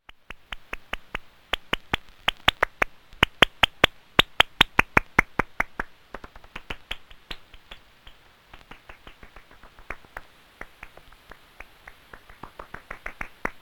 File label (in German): Breitfluegelfledermaus